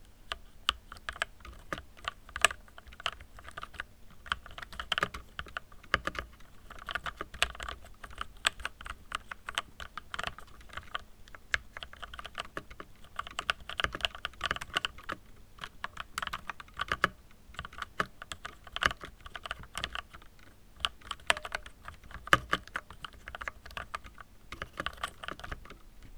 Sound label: Keyboard Typing